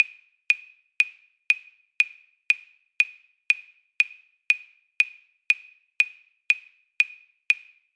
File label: time feel count solo